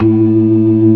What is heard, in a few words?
ORGAN, NO PERC; Keith Emerson Signature Series; C3